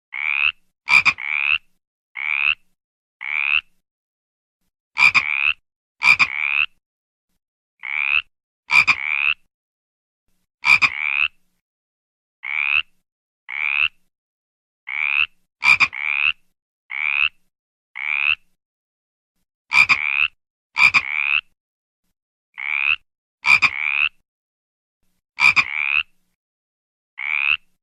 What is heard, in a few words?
frog, ringtones